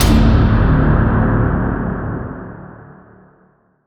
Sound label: Security Stab Bad; Brink